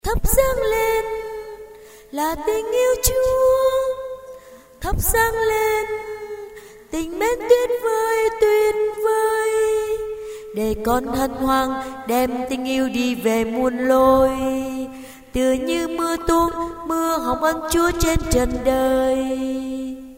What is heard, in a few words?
basso